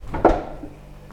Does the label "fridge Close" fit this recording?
Yes